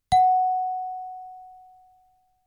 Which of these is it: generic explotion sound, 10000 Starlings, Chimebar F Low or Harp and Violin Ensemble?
Chimebar F Low